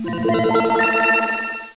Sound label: battle won; Solar Wars